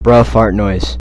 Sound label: bruh fart noise